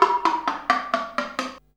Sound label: pitch hits; Cartoon - film effects